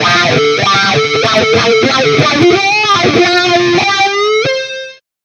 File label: Stereo Flanger Galactic Asteroid Wobble Guitar Dry; Sonicircuit; Syntheway